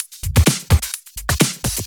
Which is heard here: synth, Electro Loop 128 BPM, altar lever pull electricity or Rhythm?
Electro Loop 128 BPM